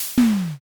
hydraulic

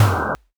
boom impact

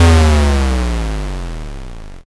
explosion

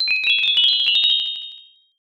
xmas bell

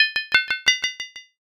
armor

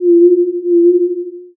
owl

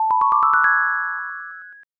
rainbow

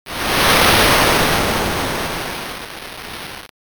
wind

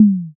tsuzumi

